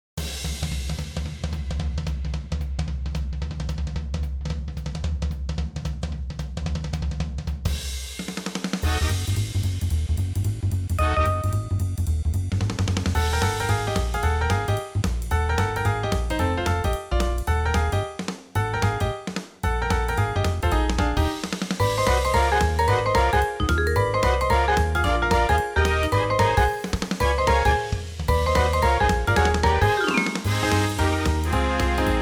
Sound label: Nintendo Jazz